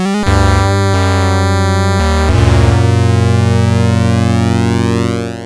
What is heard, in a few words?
sid CSG8580R5; Prince of Persia